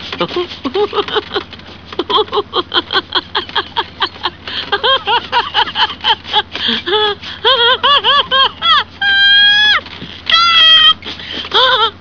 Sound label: GIGGLE; [George giggles in bed when Martha tickles his feet with a feather]; George And Martha